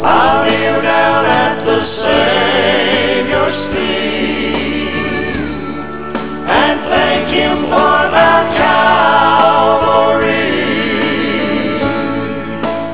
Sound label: kneel down